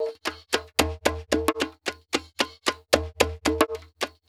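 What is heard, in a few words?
PERC 1, 112 bpm, Funk; USB Soundscan vol.41; AKAI; Roots Funk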